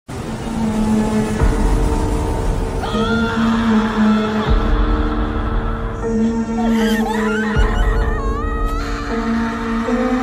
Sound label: Anime characters painful screams